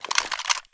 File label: mp7 draw